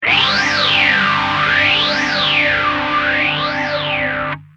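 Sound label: guitar, Yamaha phaser; ph100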